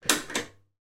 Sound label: household pull cord style light switch on or off